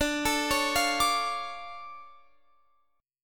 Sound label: D Minor Major 7th D down Guitar Open F; DmM7; arpeggiated